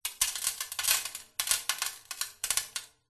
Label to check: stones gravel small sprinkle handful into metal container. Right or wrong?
Right